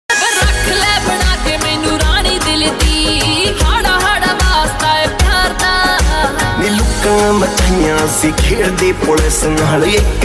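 lofi punjabi song music dance song rajasthani song dance music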